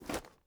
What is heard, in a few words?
ui pickup tarp; Rust